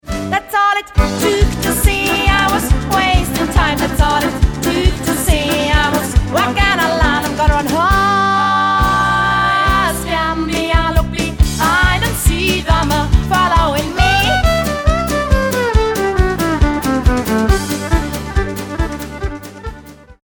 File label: Lemming Polka